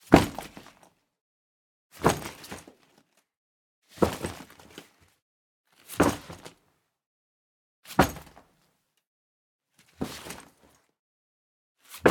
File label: Bag Foley, Duffel Sport bag, Polyester, Fake leather, Drop, Place; Borg Sound